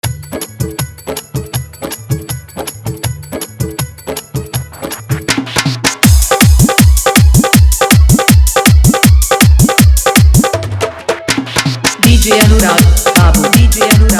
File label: Hard Dhollki Bass Mix